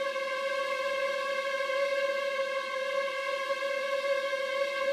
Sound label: Helicopter Crash; Alone in the Dark; PS2; PS3; Wii; Xbox 360